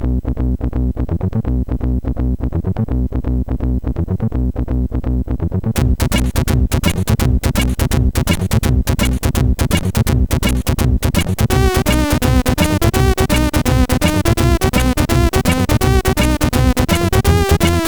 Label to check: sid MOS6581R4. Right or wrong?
Right